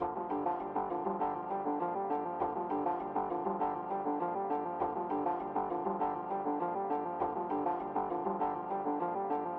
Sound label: music interactive; World of Tanks